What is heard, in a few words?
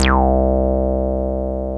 TB303 SQR, ROLAND TB-303 BASS; Rubber Chicken Software Sample Bank